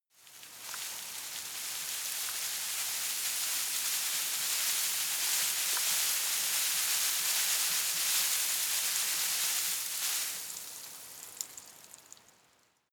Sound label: shrub tiny leaves rustle medium; haus-garten